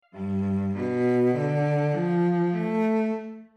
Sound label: F-Clef Lines